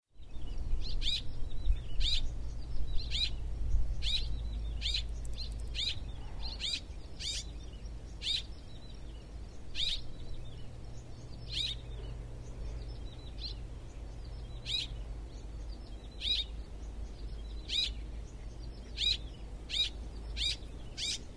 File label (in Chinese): 赤腰燕 Cecropis striolata